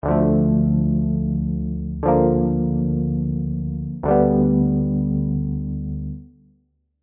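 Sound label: Spire, keys; alonso happy holidays 2021 spire soundset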